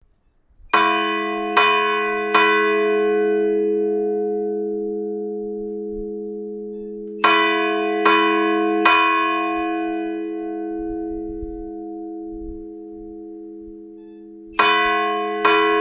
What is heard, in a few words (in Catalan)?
veniu veniu, toc, campana gran, Seqüència: 12 tocs, pausa 4 tocs cada 10 segons fins l’inici de la missa; campanar; Es tocava amb la campana gran (Josepa – Francesca)